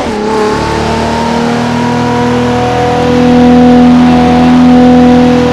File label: supra gear, vehicles cars; tdmcars